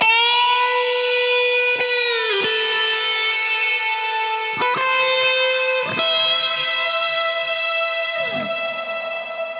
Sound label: E Phrase Soaring Guitar; Zero-G; Future Wave